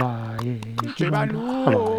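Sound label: Music Village Calm Inside; From Dust; ps3; xbox 360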